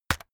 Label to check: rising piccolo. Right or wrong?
Wrong